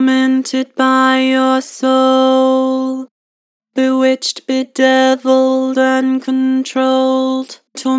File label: Tormented by your soul, Vocals; Harmor